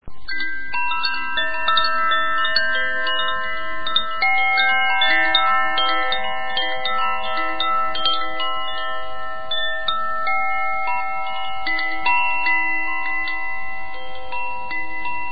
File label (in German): shanti, Klangspiele